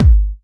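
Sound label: BD Trancy, bass drum; Club; VEC1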